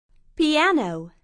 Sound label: piano